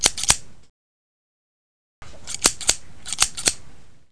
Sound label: scissors